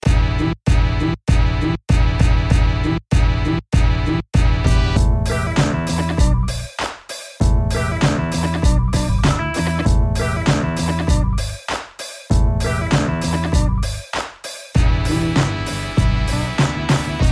Oldschool ringtone

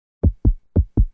Heartbeat

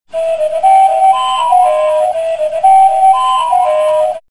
Sword

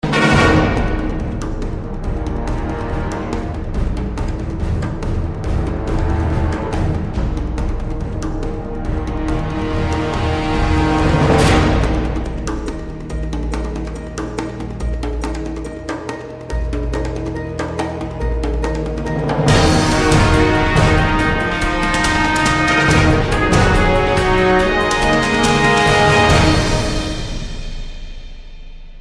Orchestral

